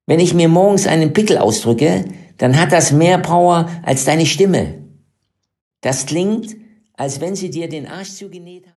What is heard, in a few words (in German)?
Karaoke